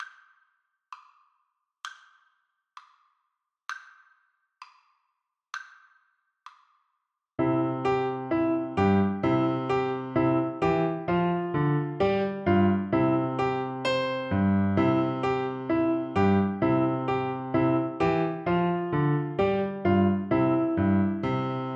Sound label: ecossaise, Flute